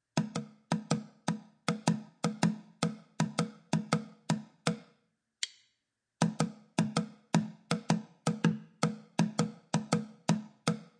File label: Drumming